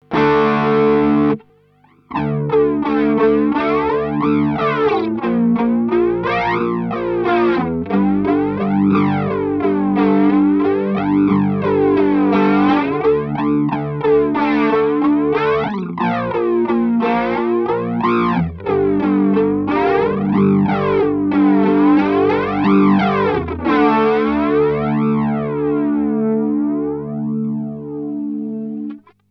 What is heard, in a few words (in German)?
mit Verzerrer; EHX WOTM